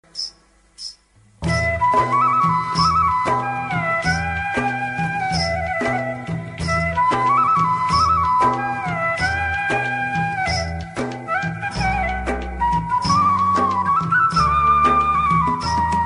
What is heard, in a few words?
Kannada flute love bgm ringtone